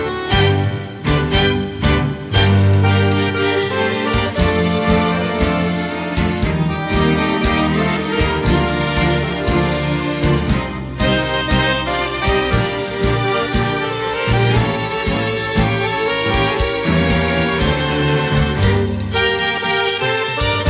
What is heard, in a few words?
Tango